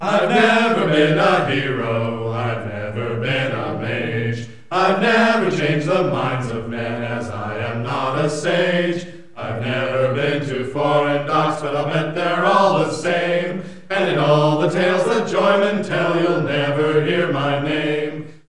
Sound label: Tavern Song